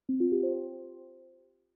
Windows Notify Calendar; Windows 11

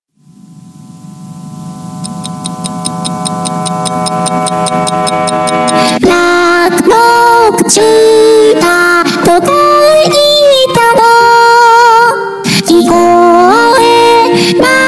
Noise

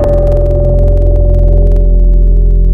Weighty, Tropical Mallets; Synthetic's Serum Collection; SparkPackers

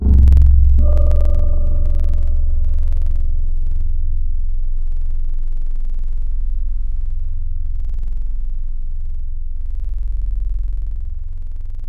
Real Upright Piano; wavetables; Instruments; Synthetic's Serum Collection; ESW Core Wavetables; Echo Sound Works Core Tables

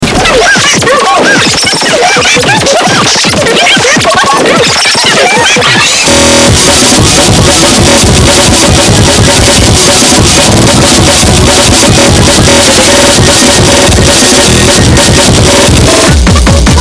HARDCORE

